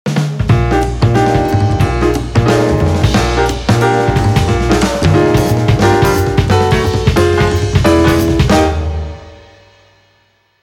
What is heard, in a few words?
Jingle Goal; Gran Turismo HD Concept